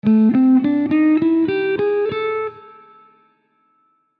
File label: Light Mahogany wooden pick; SixStringers